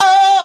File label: "owh", Voice